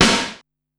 Crack Snar, Korg Wavestation SR; Single Hits

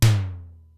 Simmons SDS-9, Drum Machines

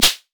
Shaker Pairs; Shakers

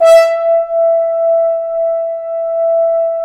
BRS, FHns Accent; Roland L-CD702; Accent-Swell

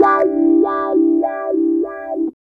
Clavinet; Ambient Grooves

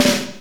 snare R B, Roland snares

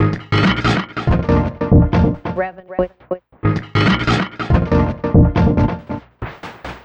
Envelope Beat; Beats; Drums & Drones

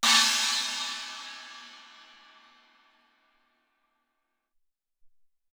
Amb Chna, Alesis SR-18

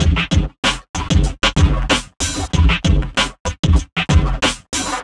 Funky Beat, Drum N Bass; Sonic Foundry (Sony Creative Software); Knutrix Drum & Bass

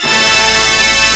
ORCHE; CMI Sound Library Rev 1.3; STRINGS

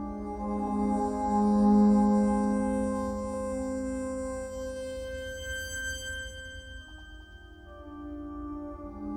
Kelele, Kelele Drones; World Percussion

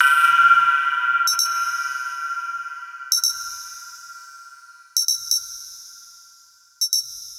130 Perc Metal Atmos; Zero-G; Future Wave

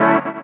DR. LOOP; X-Static Goldmine 3; CHORDS

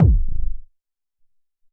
House Kik, Alesis SR-18

